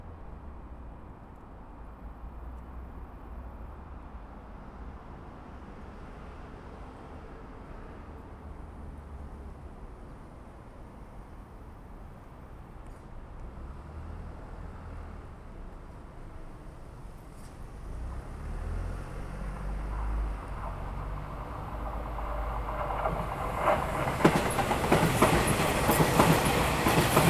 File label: Stereo, walk; Field recrding walk